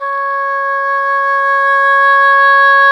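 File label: english horn, swell; Roland L-CDX-03 Disk 1; Eng Horn Swl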